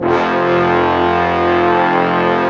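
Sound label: brass section, large ensemble long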